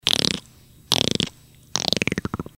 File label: click roll, human beatbox